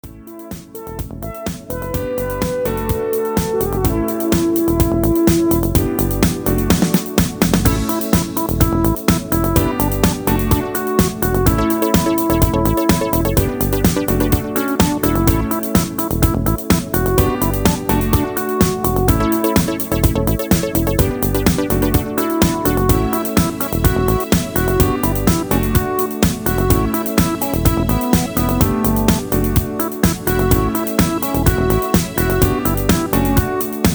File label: Intrumental with Leads